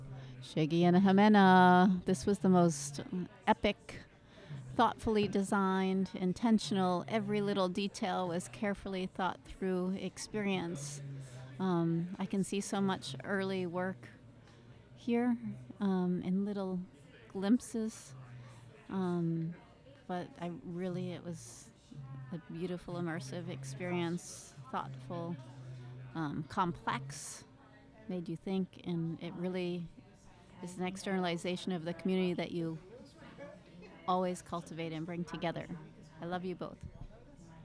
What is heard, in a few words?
Audience Recording; a-meal-premiere